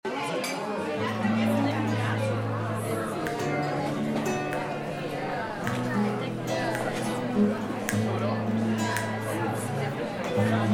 Spanish restaurant ambience music and people talking